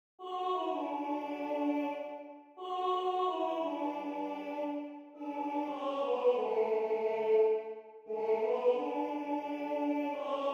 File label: TTBB TENOR 2 with other voices; audition excerpt